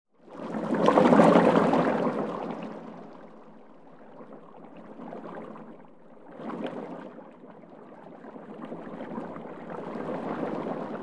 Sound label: Water bubbling